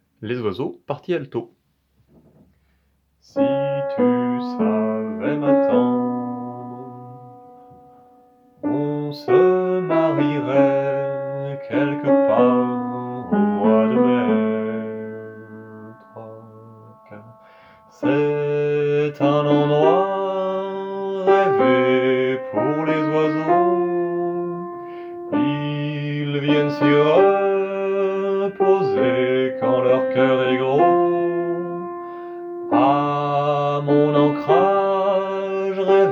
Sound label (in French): Les oiseaux alto